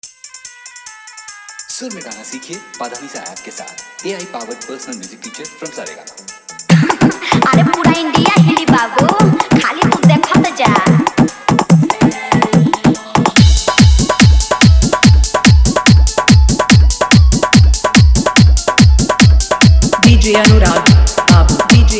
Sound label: New Bhojpuri Hard Dhollk Bass Mix